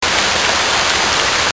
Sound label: light rain loop